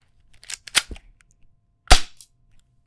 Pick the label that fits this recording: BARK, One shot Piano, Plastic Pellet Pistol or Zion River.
Plastic Pellet Pistol